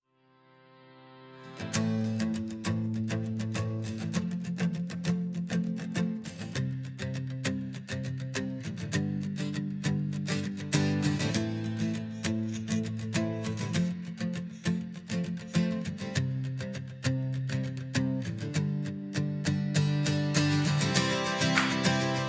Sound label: instrumental